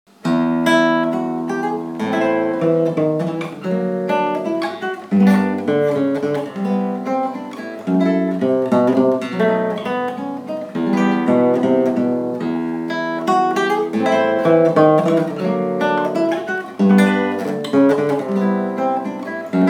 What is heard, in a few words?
Guitar solo; Guitar Club Settembre 2015